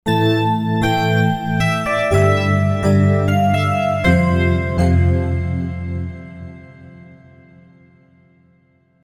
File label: D-50; Nearafter